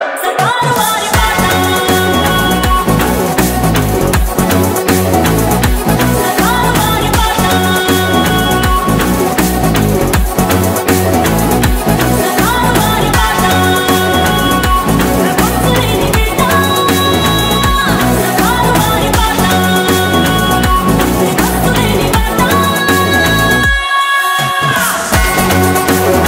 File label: Title Song Ringtone Theme song